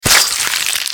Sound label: bite; shaoxiadiablo; skill; sk dragonhead